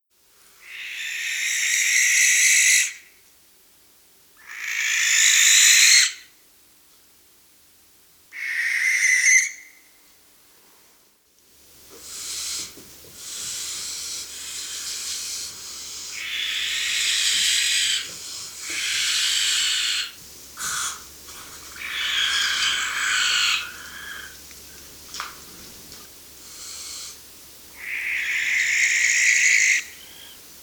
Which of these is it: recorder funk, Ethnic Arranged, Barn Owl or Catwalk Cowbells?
Barn Owl